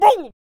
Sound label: Potionseller Attack Vocals